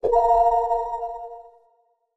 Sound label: magic